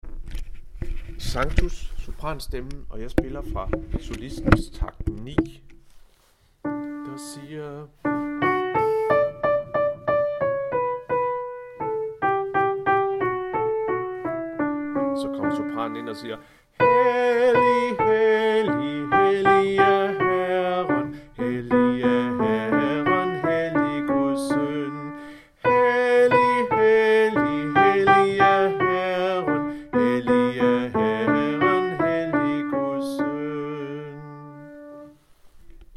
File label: Sanctus sopran; harlev koret